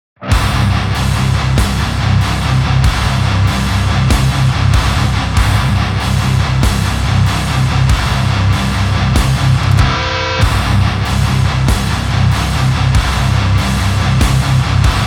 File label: Metal Song